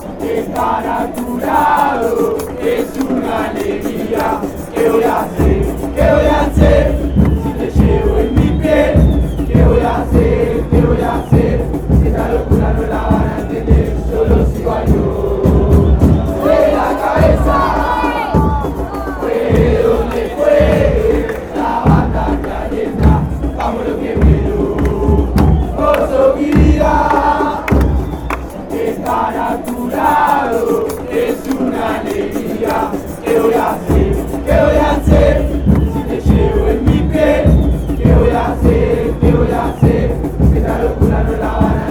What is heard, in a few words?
chant, argentina; huracan